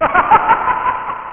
Haha; Yooz V1.26; Sécurité

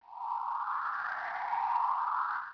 fly; Game Zone; CHECKERS INTERNATIONAL

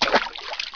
step water; SpecOps2 MPlayer Demo

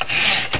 typo; ClickArt 300,000

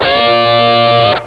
guitar ben, string instruments; Sound Effects for Multimedia - Volume 1

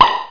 Big Pop

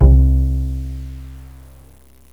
Acoustic Bass; GMTones; 8-bit Sampled Voice

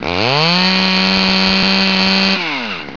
Chainsaw; SimTown 1.1

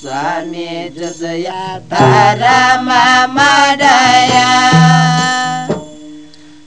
Chant

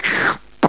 Eating; CManual-3.0

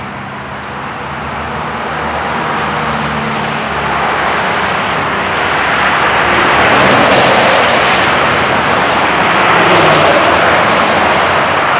hiway, transportation

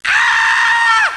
Player Death; Mythos

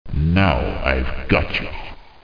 Attack; RamsesAttack; senet; nile

